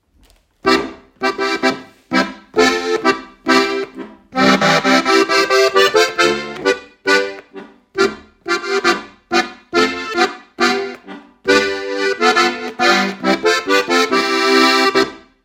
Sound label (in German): Boarischer